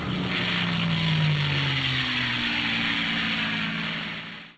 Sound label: engine